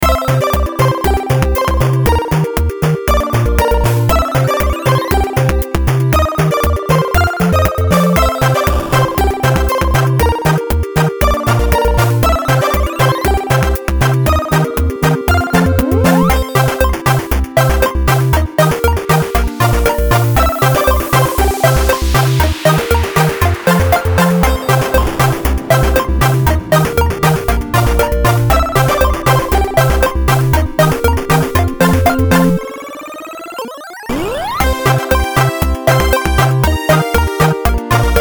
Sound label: game 8 bit